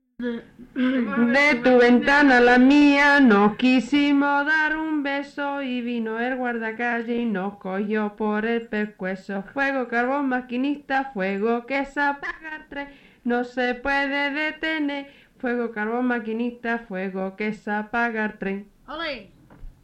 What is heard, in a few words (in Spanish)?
andalucia de tu ventana